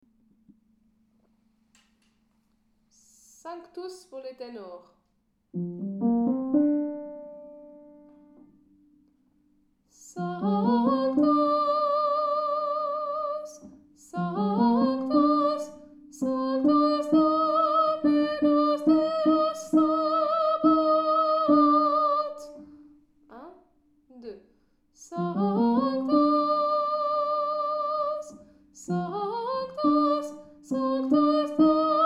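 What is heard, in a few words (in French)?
sanctus ténor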